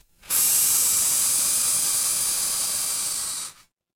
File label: mechanical machine